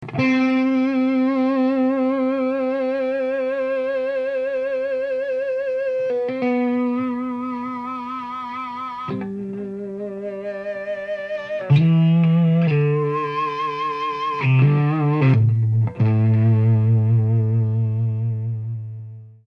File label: 6v6 Feedback; Plexi 6V6